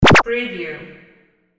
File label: button